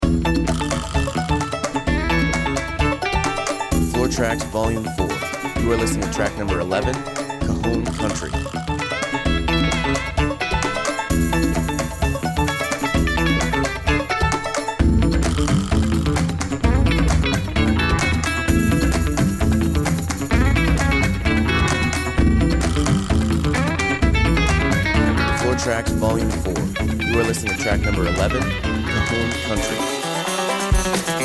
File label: Cajon Country